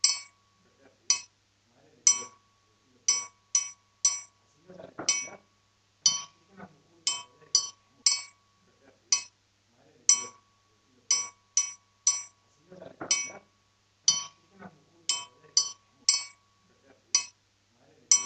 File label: Batucada Triangle